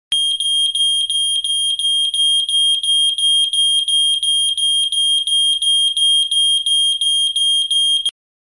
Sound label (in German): Rauchmelder